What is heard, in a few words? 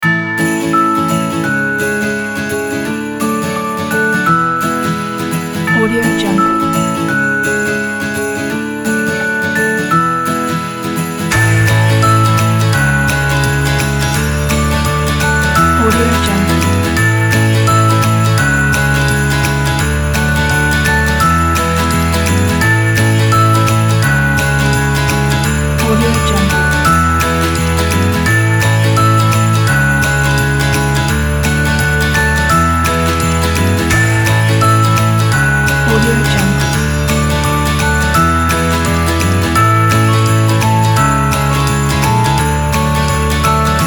happy holidays